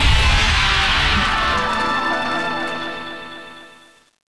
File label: Stage clear; Radio Hammer Station; PS Vita, PS4, Windows